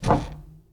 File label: Foosball Goalie Spin